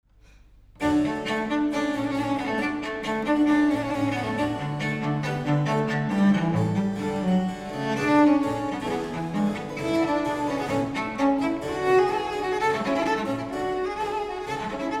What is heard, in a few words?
sonata for violoncello and bc allegro; telemann